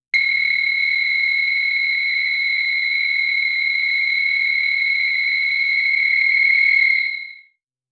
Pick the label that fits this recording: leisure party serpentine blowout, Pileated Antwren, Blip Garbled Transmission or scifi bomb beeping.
scifi bomb beeping